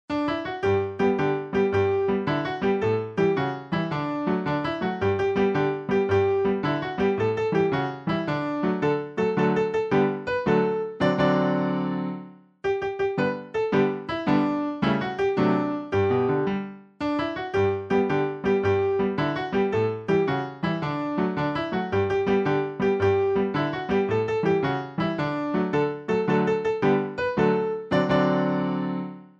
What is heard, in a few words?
instr; Bonhomme Bonhomme